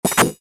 object door open

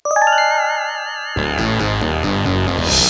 Horror HAUNTED HOUSE INTRO; Deathwish Enforcers

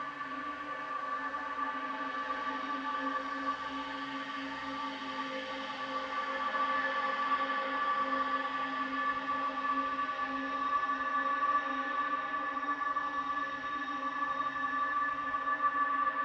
Amb Handyman Musical

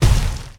Super Dash Impact Wall; Hollow Knight; Hero Super Dash Impact Wall

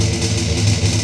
Laserb

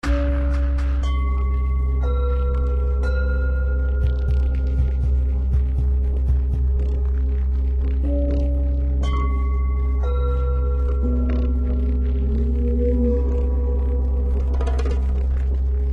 Darkest Horror Music

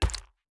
Enemy Clicker Walk

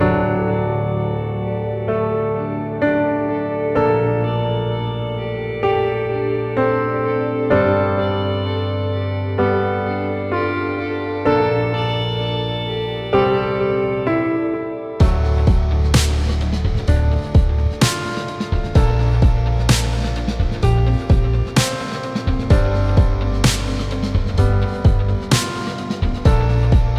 BGM field red; starbites

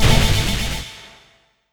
Elementaliste Skill Spell; Legend of Keepers

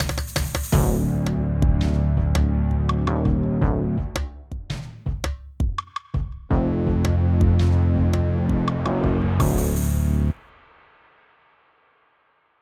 Wasteland Battle End

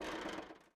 Mech Footstep Texture Metal

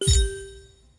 UI exploration popup

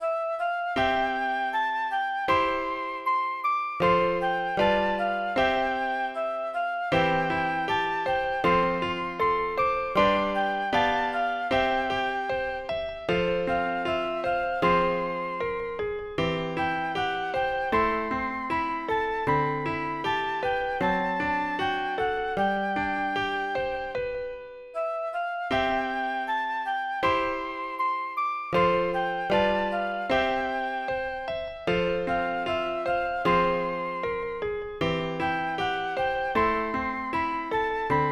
Pokégear Radio Poké Flute; Pokémon HeartGold SoulSilver Remastered 2022

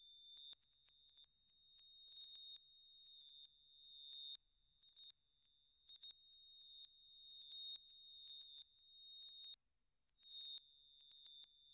Wind Chimes; Mrcisum

